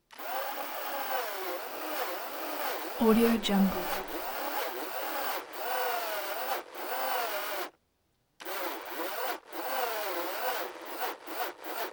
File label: servo slow jerky long bursts labored motor